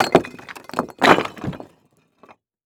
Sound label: Crash Brick Building; crashes; AdobeCC